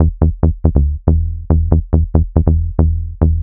ELECTRO BASS 140 A

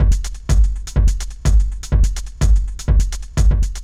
DEEPHOUSE BEATS 125

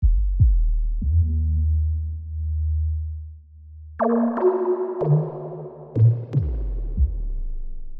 120 F# Kick the sub, Bass Loops; Touch Loops; BlissedOutBeats

